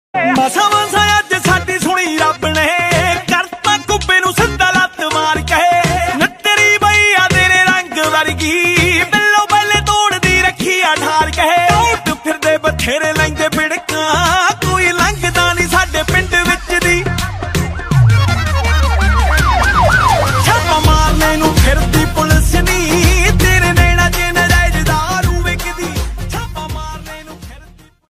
Dance Punjabi Ringtone

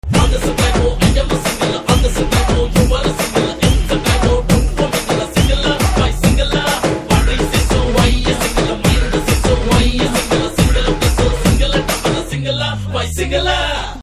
BGM Telugu Ringtone

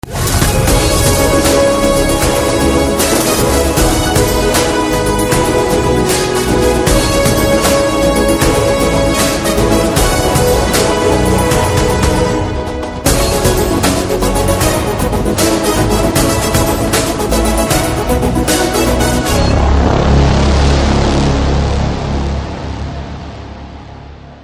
Roar Theme Telugu Ringtone; NBK BB3

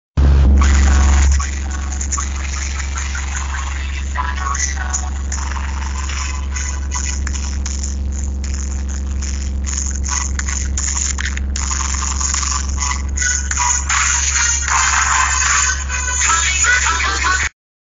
Rip Ear Bass Drop

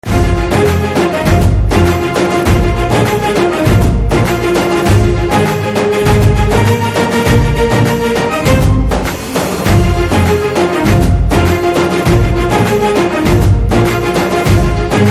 BGM Telugu Ringtone

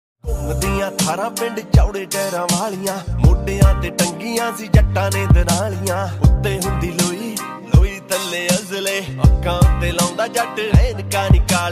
Punjabi Ringtone

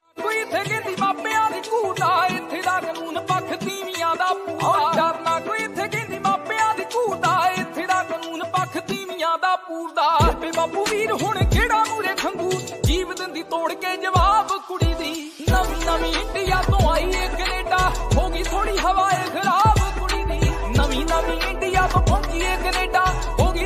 Punjabi Song Ringtone

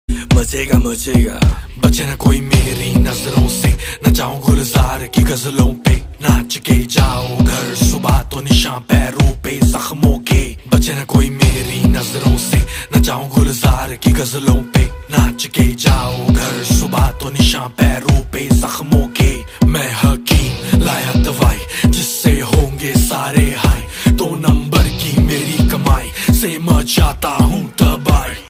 Jatt Punjabi Ringtone

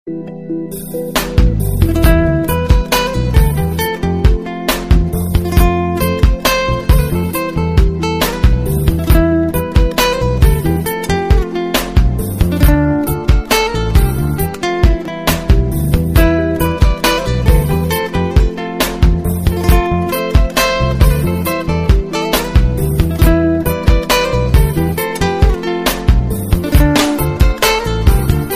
Guitar Ringtone

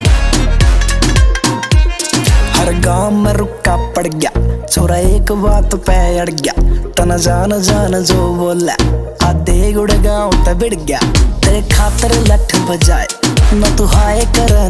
Punjabi Beats

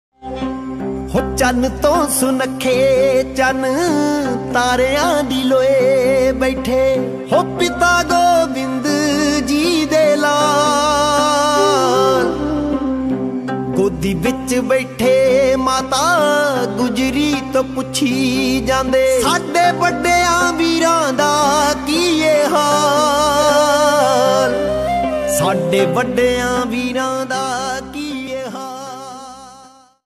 Punjabi Ringtone

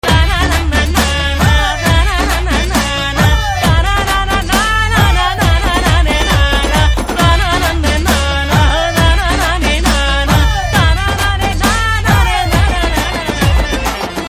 BG Tone Telugu Ringtone

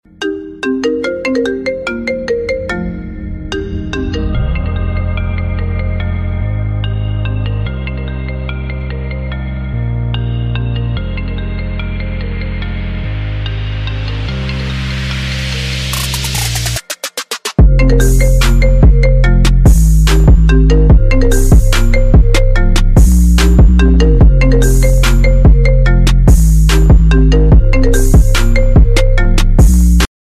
Iphone Ringtone Remix

